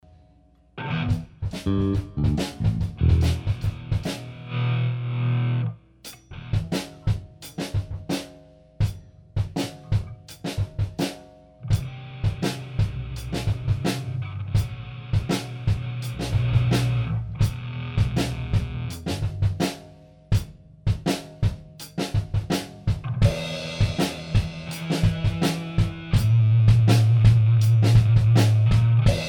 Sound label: Jam; live gigs